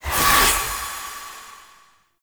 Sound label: Ward Cast Healing Totem Accent; Powers; Fey; Founders